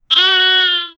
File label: a cow having a short, farm